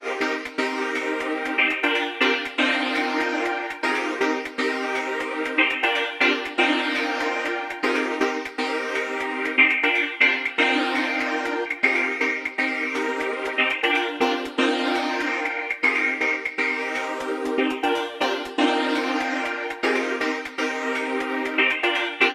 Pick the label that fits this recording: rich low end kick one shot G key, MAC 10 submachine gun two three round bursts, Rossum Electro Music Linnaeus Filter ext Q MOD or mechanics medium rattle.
Rossum Electro Music Linnaeus Filter ext Q MOD